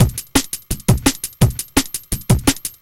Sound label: Warhead Break 2, Breaks, Jungle; Blu Mar Ten - Jungle Jungle - 1989 to 1999 Samplepack